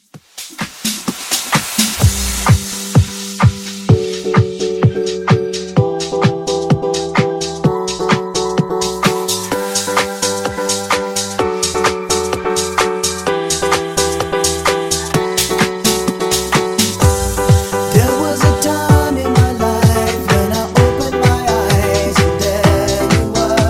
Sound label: Funk Bootleg 128 Bpm